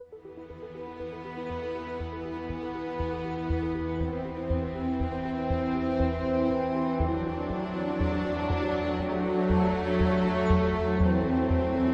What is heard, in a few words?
Deep Ambient